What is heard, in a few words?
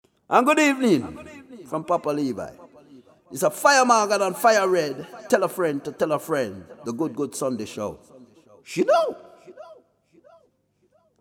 TELL A FRIEND JINGLE